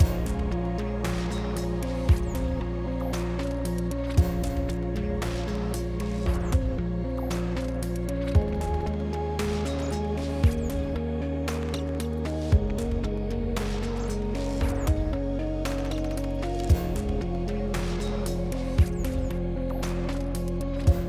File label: Puzzle 57 5BPM